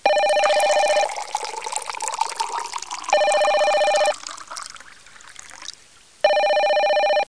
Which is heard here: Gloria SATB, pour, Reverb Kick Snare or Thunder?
pour